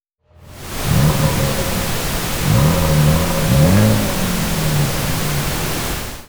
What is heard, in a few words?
Rev pink